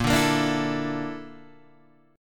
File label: A sharp Major 7th Flat 5th A sharp Guitar Half Step Down; A#M7b5